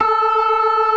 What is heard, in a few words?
TARK, ORGAN SPLIT; InVision Interactive; Keith Emerson